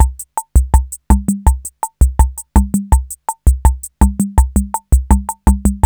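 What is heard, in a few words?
CHA-CHA, drum machine; Crypton - DrumBase5000; TR-66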